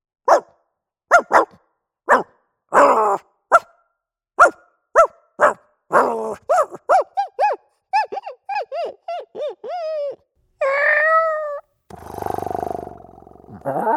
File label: Creature